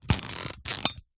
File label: industrial clip spring loaded plastic open creak